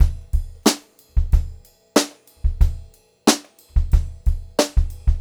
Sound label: Brushed Drum Loops; USB Soundscan vol.39; AKAI